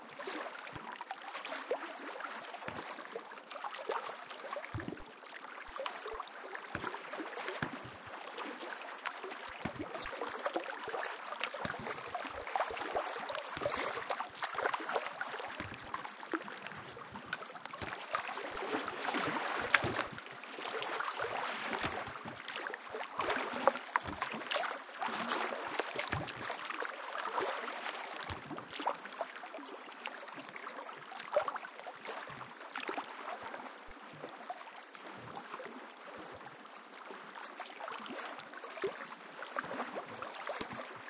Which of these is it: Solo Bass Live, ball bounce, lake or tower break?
lake